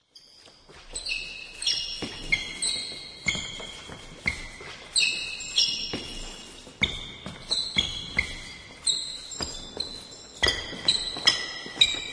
basketball shoe squeaks

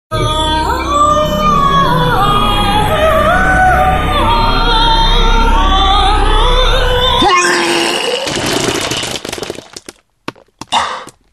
spaceship

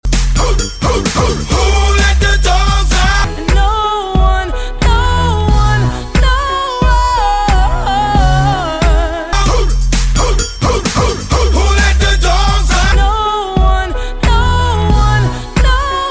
comedy remix

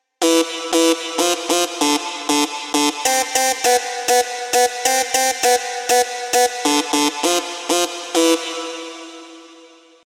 Trap

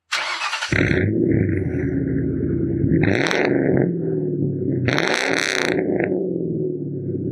03 Mustang GT Start

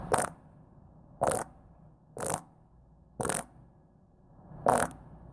Bahamian Conch Talk

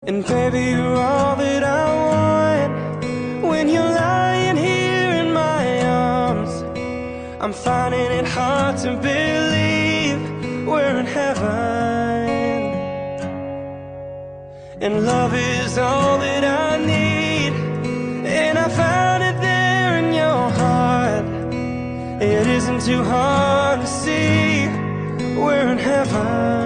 Piano

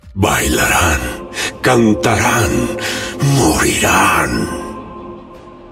Laugh; Jhin

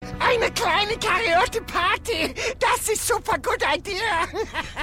Is Calling Ska, ringtones

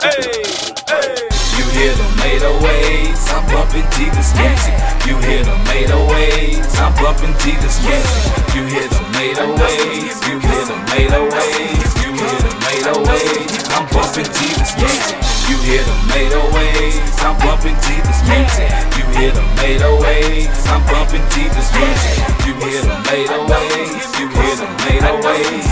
sweet flute mix

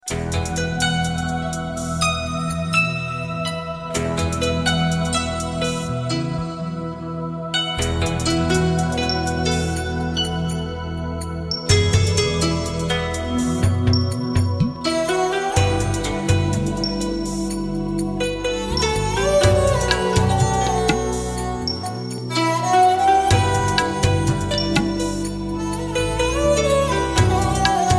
romantic tone, ringtone

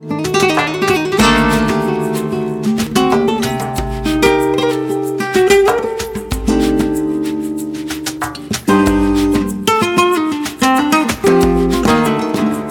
tango flamenco

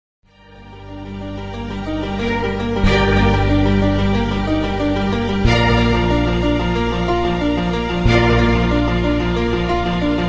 hip hop instrumental beat

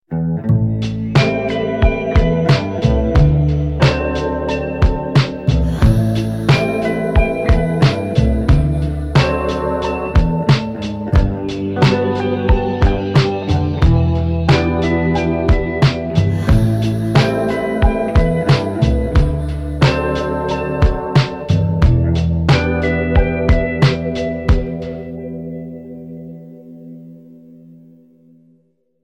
Minimalist Ringtone

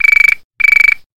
telephone

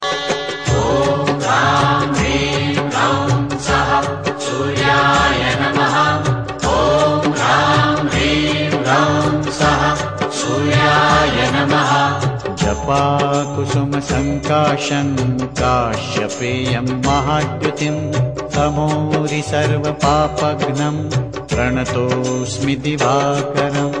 surya mantra

